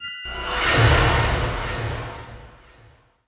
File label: outro Free Spin; netent; bloodsuckers